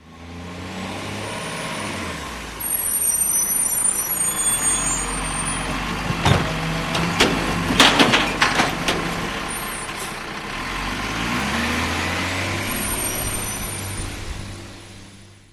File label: Recycling Truck